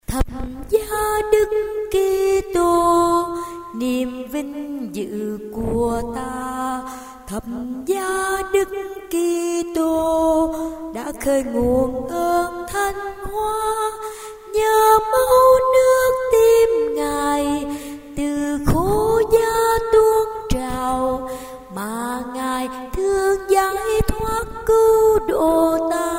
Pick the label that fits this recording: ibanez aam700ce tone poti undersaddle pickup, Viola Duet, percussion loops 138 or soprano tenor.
soprano tenor